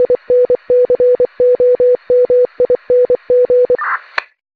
headset message; Paradise

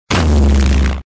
void pull, magic; void tp effect

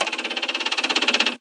scroll, computer; GS13; nsv13

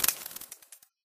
sparks

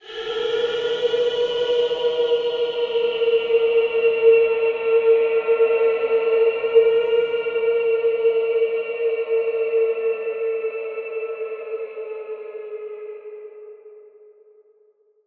falling through clouds; hyperstation

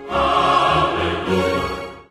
pray

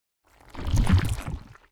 squish

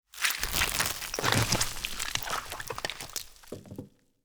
hatch, alien

